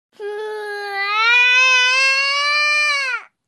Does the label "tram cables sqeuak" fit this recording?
No